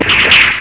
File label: stun gun